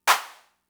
Clap, Fricke MFB-522, Drum Machines

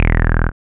BASS, Tekno Industrial; East West

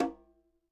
CH op m, KILLER CONGA

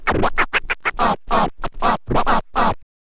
scratch kit, vinyl

